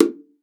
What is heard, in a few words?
BONGOS; Xsample VOL-9